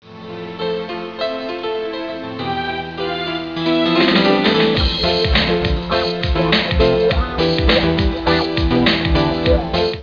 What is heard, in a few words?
melody